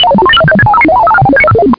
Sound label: Effects Computer Beep; Scratch game maker software